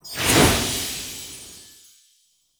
Loot Box Item Reveal; Baro Ki Teer; Warframe

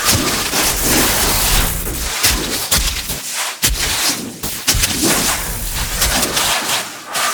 reactor arc loop; Ambience; Gameplay; Warframe; Corpus Gas City; Gameplay Remaster

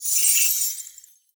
Naginata Whoosh Tonal, Weapons Melee; Warframe; Koumei Naginata

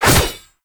Bayonet Melee Whoosh Fast; Warframe; Tenno

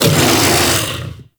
Kubrow Armored Vocal Attack Medium; Warframe

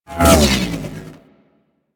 Space Fighter Laser Fire Whiz By; Space Fighter Laser Agent

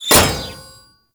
Kunai Impact Default; Warframe; Hit Effects; weapons

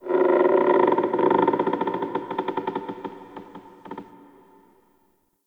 Zariman Wood Creak

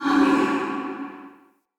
Ghost Soldier Pain, Vocals; Enemy; Wandering Ghost Soldier

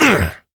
Pain, Adult Operator; New War

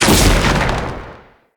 Lightning Slam Explosion; Characters; Enemy; Abilities; Sinecure; Banneret

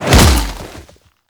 Throw Impact; Warframe; Kuva Lich; Finishers

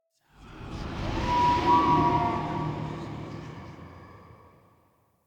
Whoosh In; Cub Diorama B; Cinematics; Orengall Quest

